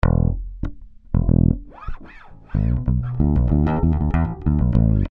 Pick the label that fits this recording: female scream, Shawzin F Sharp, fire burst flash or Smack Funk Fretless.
Smack Funk Fretless